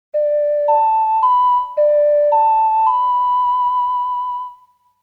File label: Ocarina Intro; Majoras Mask Original Soundtrack